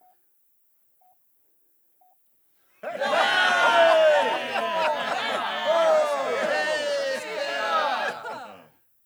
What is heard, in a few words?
Big Cheer